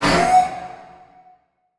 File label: Transition Whoosh Alarm; Production Element